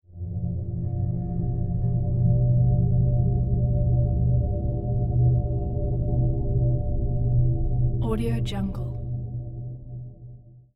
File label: space transition